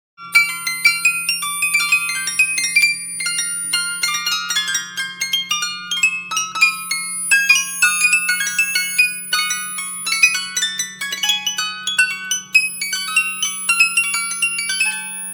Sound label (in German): glockerln